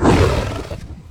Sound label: Monster Attack; Monster Ralph Attack